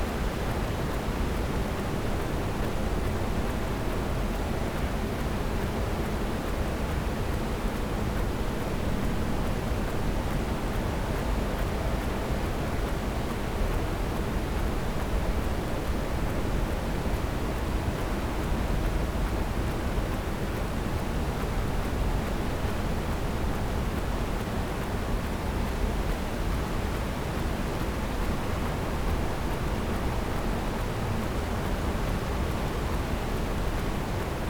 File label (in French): cachalot negatif, Physeter Macrocephalus